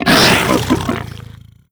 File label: grab react player, barnacle; Half Life Alyx